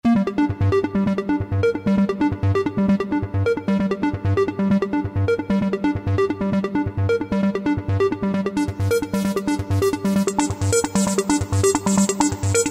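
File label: Synth Square, Yamaha YPT-400; Voices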